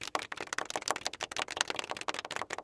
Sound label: Human Crowd Golf Clapping